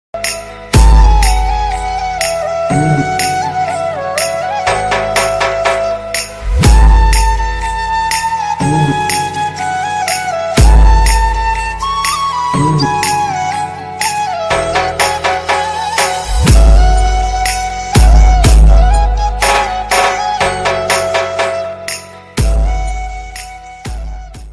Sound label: Navratri Flute Ringtone; ringtones